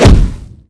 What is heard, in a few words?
foot kick wall